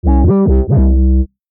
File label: 808 Slide